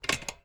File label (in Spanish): cambio marcha, YBR125